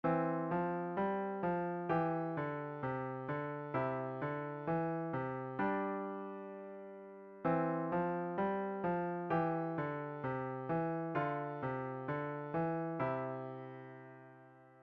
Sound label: Coordination, piano exercises